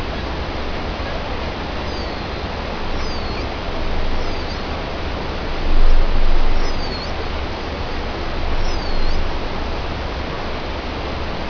big whistles; 5 big whistles

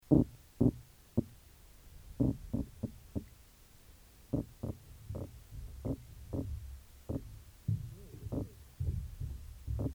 Opsanus tau, soniferous Carolina fishes

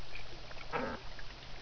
grunt type c, fish

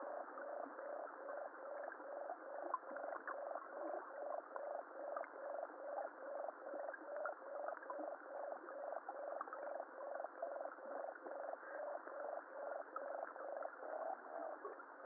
sonar like biological

